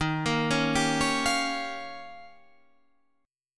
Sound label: D sharp 9th D sharp, Guitar Standard; D#9 arpeggiated; JGuitar